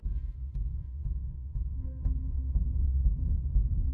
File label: victory fanfare; sound effects